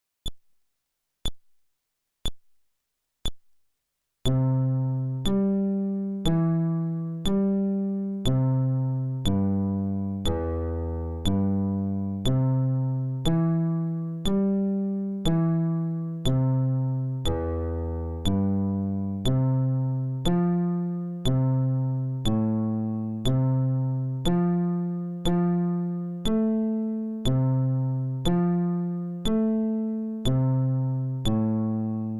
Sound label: Arpeggio, fretless bass